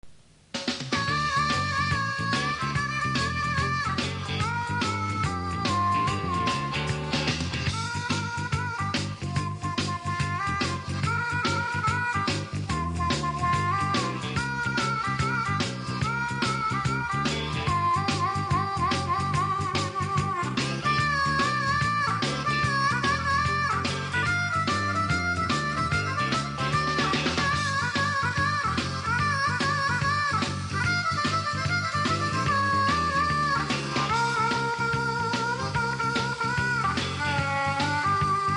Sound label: blues for jo, harmonica